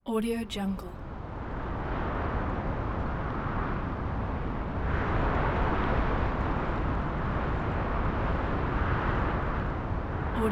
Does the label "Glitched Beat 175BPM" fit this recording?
No